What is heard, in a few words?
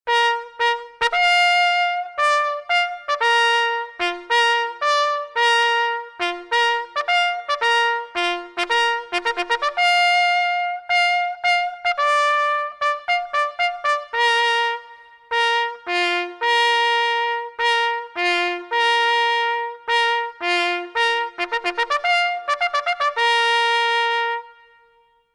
retreat, bugle; usscouts